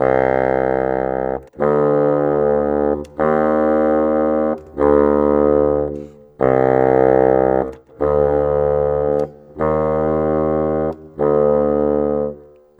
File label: Bassoon, Cinematic; Sonic Foundry (Sony Creative Software); Orchestral Series VOL-3 - Cinematic